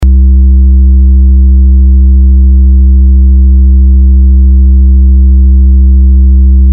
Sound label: vco sine wave, modular synth; Sinus